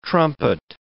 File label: trumpet